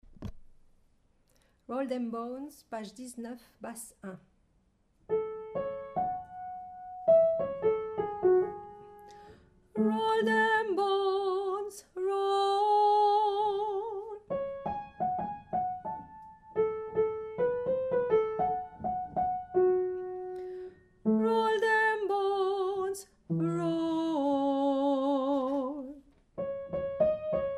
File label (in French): Basse